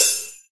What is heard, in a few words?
drum hit whistle; osu!